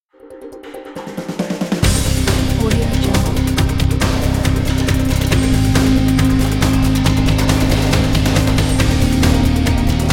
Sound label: high energy big ride